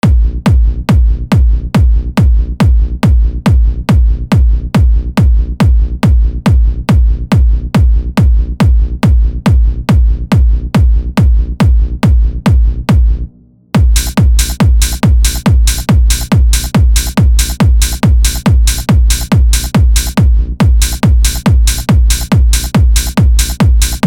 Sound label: Bass Kickin